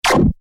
Laser Gun SciFi Single Shot, Laser Gun Futuristic High-Tech Blast Alien Weapon; Scifi Science Fiction; Lasers